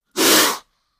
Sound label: Cobra Indian Hiss Clo, snakes